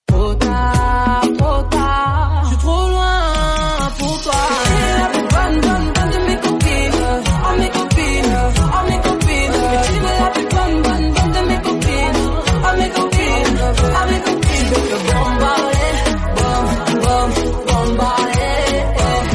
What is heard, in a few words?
iphone x marimba remix